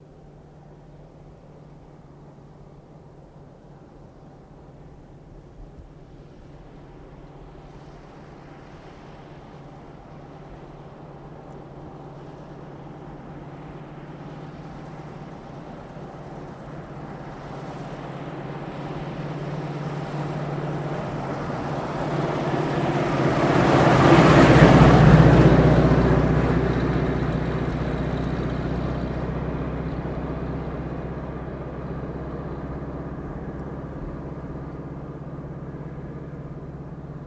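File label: Buick Coupe Slow In and By, Long Tail, antiques; 1934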